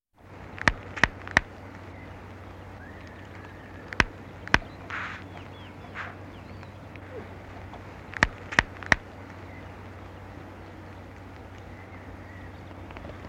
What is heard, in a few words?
tawny owl; bbc